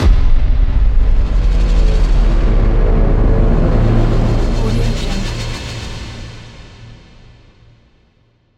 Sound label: impact moving braam